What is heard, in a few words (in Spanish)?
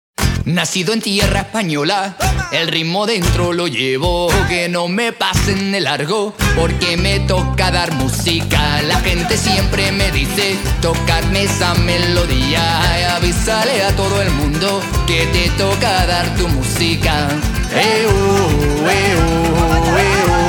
Musica